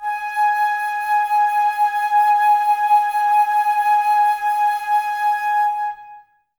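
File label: flute, Woodwind; Miroslav Vitous; Woodwind Brass Ensemble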